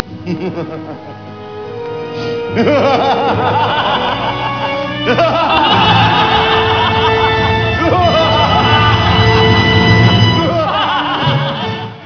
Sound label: bwahaha